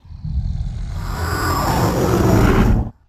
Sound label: pinky chatter combat, monsters vocalizations; DoomRTX